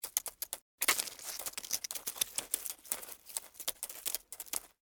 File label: Insect Walk Jump, Insect, Walk, Jump, Fall, Dirt, Movement, Bug; Sound Effects:Animals:Insects; Blastwave